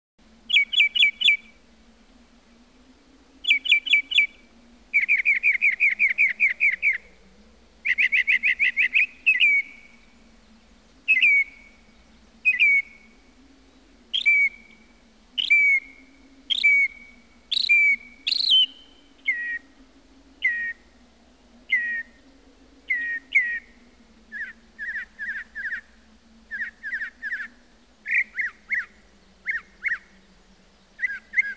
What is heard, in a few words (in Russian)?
golos peresmeshnika